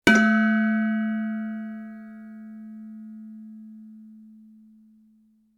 Clong vibrating sound

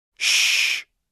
Male Shh

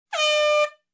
Basketball Game Buzzer Horn

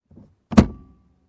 Closing compartment in car

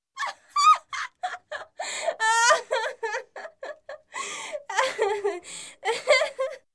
Teen Hysterically Laughing

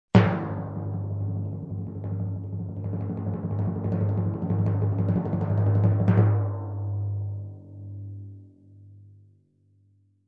Soft Timpani Crescendo

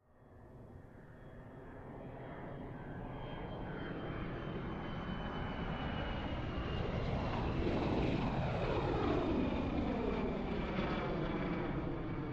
Aircraft lifting off distant

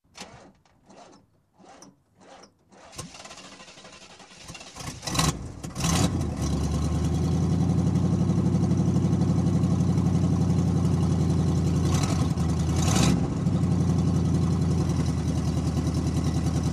Old car engine startup and running

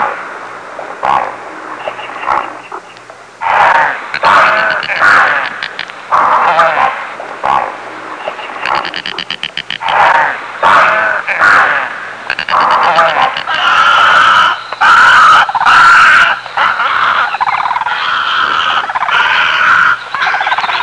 Animal Sounds screaming in old movie

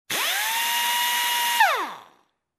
Concrete Drilling

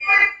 Megaphone Feedback

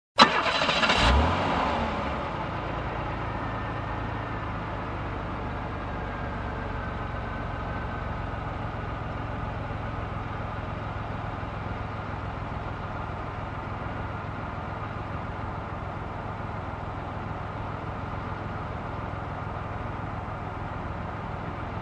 Car engine running idle

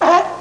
Single seal bark

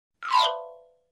Xylophone scale down quick

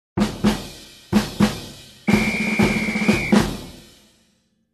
Drums with Whistle

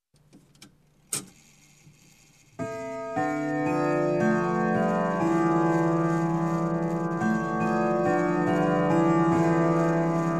Grandfather clock strike with gear roll and bells

